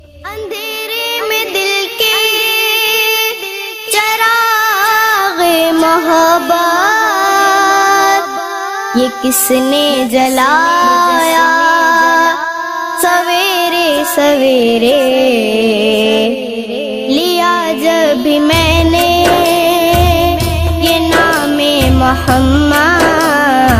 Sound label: Islamic Naat Ringtone